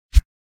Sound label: Spawn; BlockSpace v1.5.0b